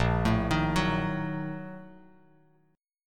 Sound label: Bb-Major 7th Suspended 4th Sharp 5th, Bass Standard, chord; arpeggiated; JGuitar